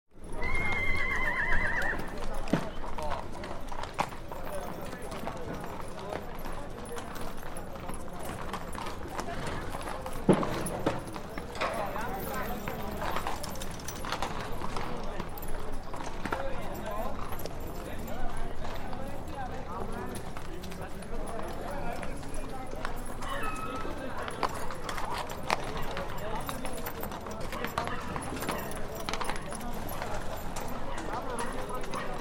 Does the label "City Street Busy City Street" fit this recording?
Yes